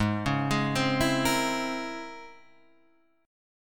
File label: Db Minor Major 13th Ab